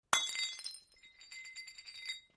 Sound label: Teacup Dropped Marbl; clay & ceramic